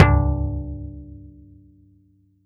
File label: Tom